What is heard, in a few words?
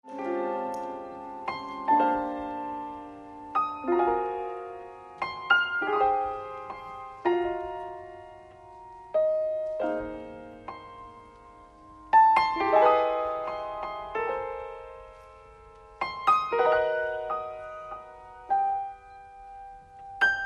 piano live